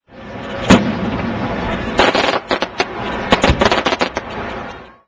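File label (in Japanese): hanabi, fireworks; 着信音やアラーム（目覚まし）音に使える、着モーションとして; FOMA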